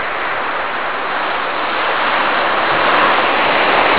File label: beach; Pasadena; perhaps it was high tide